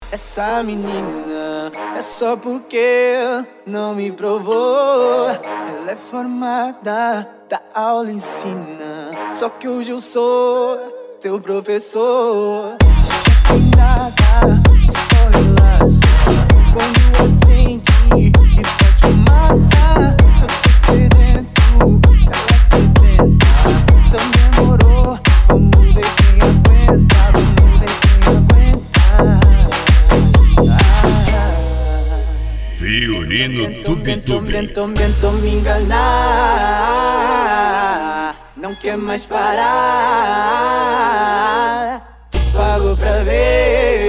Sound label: retro